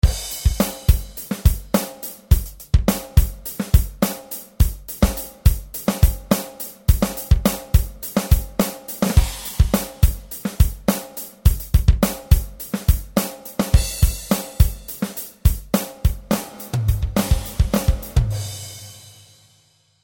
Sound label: Drums Psr-s900